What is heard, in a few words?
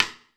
60S KITS STIK; 90 sSampleCDs; Crypton - DrumBase5000